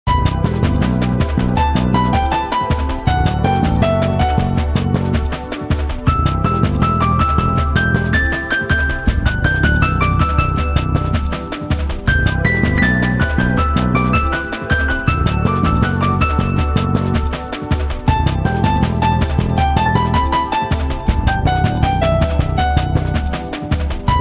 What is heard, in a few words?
Jazz